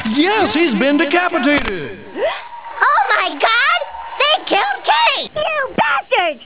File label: death; kennyrim